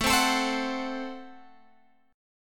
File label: A Minor 6th, Guitar, Open G